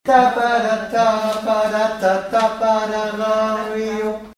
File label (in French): hommes; bibihotz